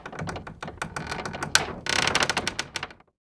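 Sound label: wood creak shipwreck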